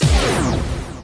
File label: Zap; tempest 4000